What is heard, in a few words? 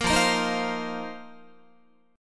F 7th Suspended 4th F down Guitar; strummed